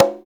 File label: RM CGA MD F, Drums; FILM KIT; Drums In Your FACE!